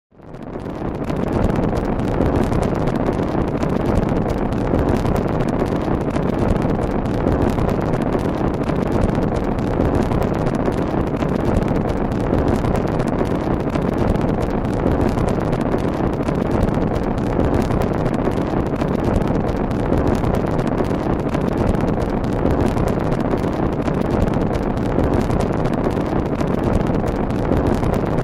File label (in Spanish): fuego, encendimiento de gas; efectos